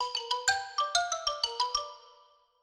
licks

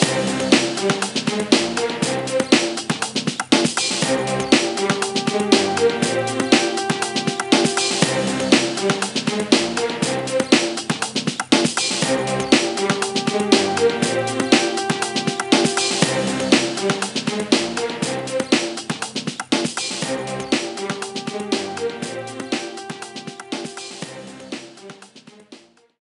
BGM Stage Result; Teenage Mutant Ninja Turtles Arcade Attack 2009; NDS gamerip

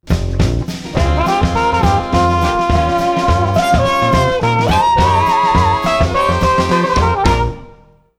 Trumpets Jammin'; voodoo vince original xbox game music 2003

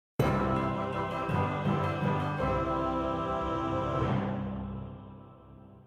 Trail complete!

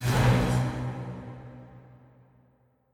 Mus Multi Jingle Pickup flag; Chronicles of Riddick Assault on Dark Athena

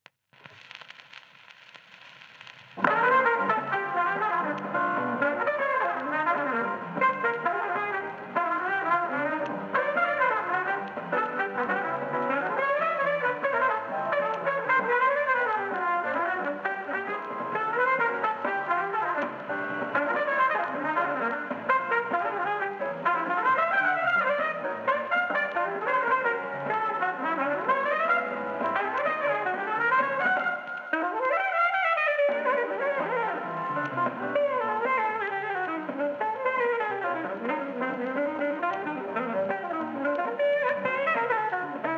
Jazz, Mono

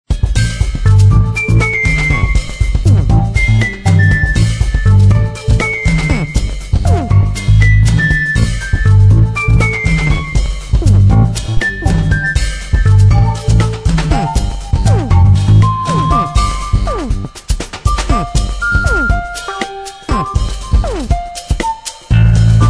Location Music Beach

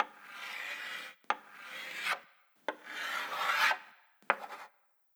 chalk details

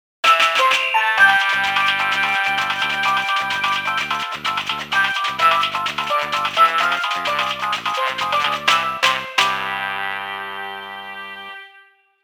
Radio Jingle (Carnival); Animal Crossing New Horizons

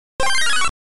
SFX - Magic Wand; Mickey Mouse Magic Wand; Mickey Mouse V Mahou no Stick 1993 GB

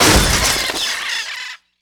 Bird Cage Breaks

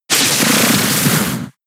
Gear Grind; attack moves; Pokemon; gen 6; XY; ORAS; Gear Grind part 2

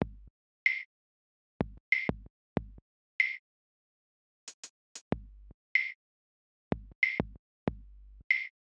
colorlab lowkey head 94bpm CMaj 14beats

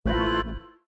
Battery Critical; Windows 7

